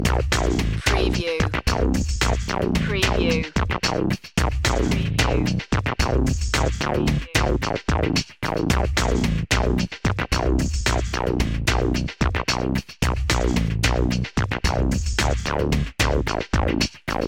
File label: underscore